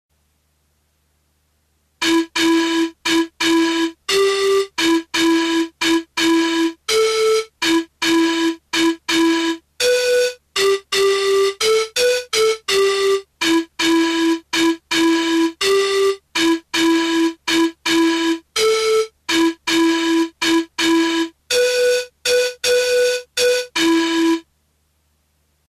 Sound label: Indian Campfire Melody